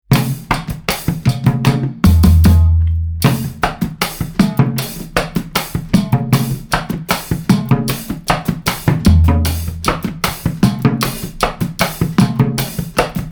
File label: tischlied instrumental